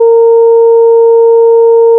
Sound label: additive voice single; FFTimbre